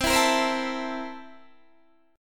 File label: C 13th C down Guitar Standard; C13 strummed